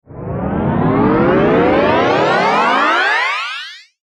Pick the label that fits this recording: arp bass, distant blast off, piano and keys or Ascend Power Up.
Ascend Power Up